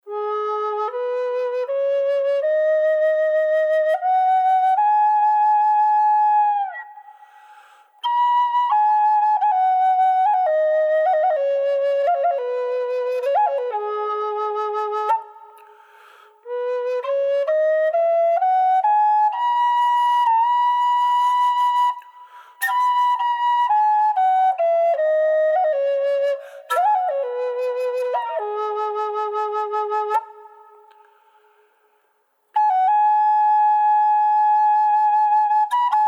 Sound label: G Sharp Pinyama Wet, native american flutes; Reverb added to the sample of this flute for the "Canyon" sound.; Curly Pyinma in G#m; Laughing Crow